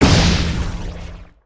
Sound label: Energy Ball Fire; weapons; aronwk collection; ndaudio